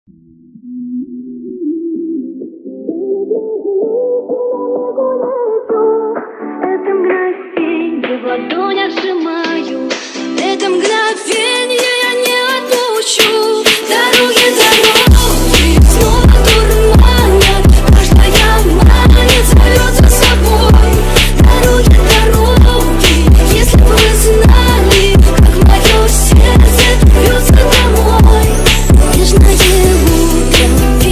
phonk edition

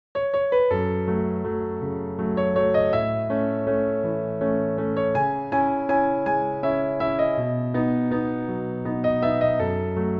piano

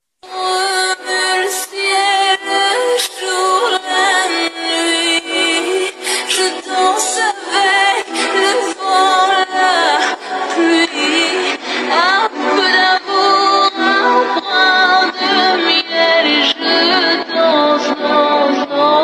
remix slowed and reverb

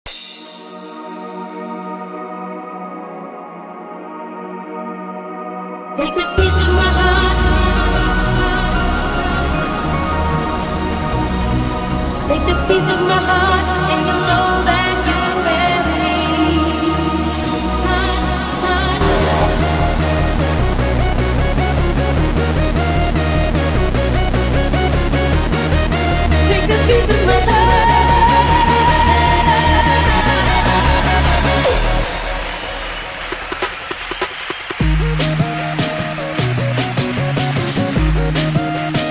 hardstyle remix

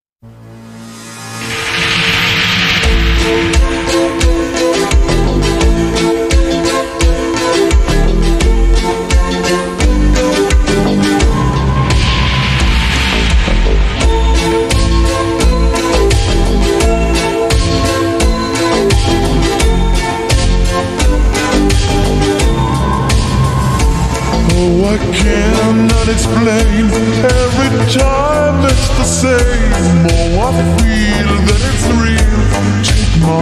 slowed reverb; Night Mood Cars 80's

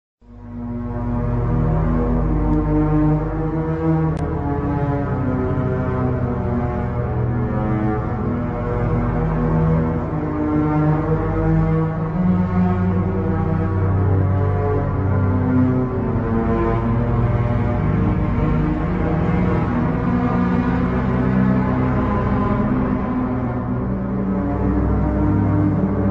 ultra slowed reverb instrumental

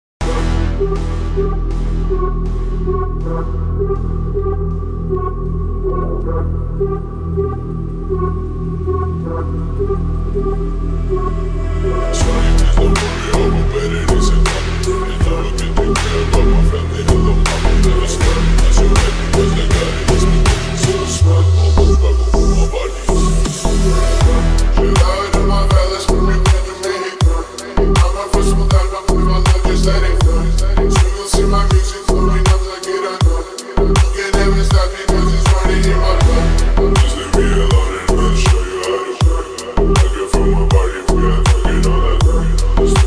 remix deep bass

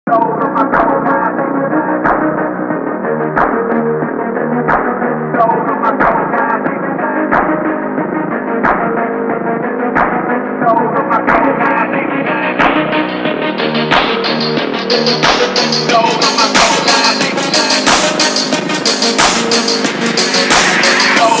phonk remix

